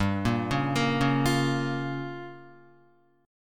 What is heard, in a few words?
G-Minor 7th Flat 5th, Guitar, Gm7b5 chord; arpeggiated; JGuitar